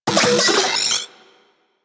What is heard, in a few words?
Vocal Detonate; Warframe; Corpus; Mini Moa